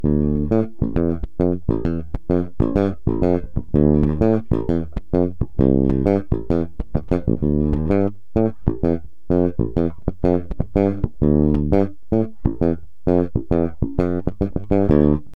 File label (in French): doigts chevalet groove